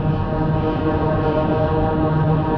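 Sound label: ambience background ship loop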